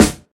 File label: rich top end acoustic snare sound g sharp key; snare drum samples